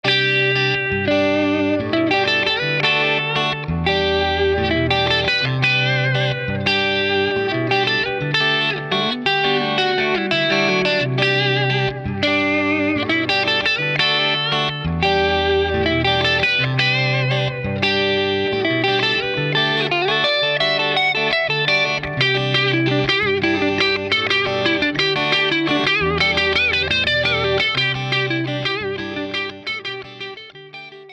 Traynor Bass Master I Hi jumped to II Hi I G&L ASAT